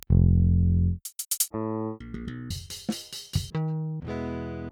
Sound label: Pultec; Virtual Analog